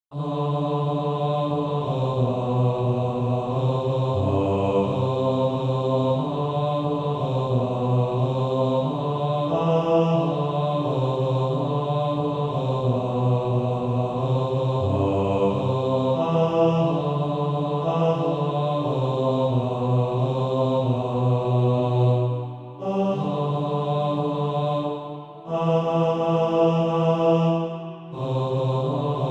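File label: Male Choir, Practise Music; Baritone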